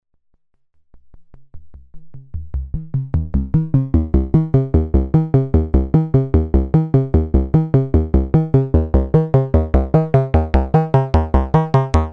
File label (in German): ERICA SYNTHS Black System EG2 auf VCF verschiedene Variationen des Filters durch EG2